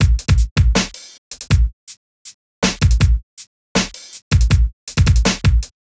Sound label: Drums; Hip Hop; Apple Loops; Big Fish Audio